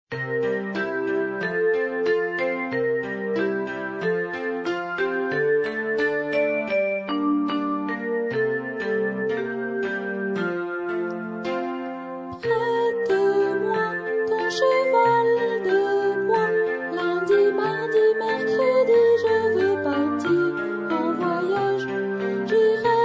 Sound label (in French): cheval de bois; Prête-moi ton cheval de bois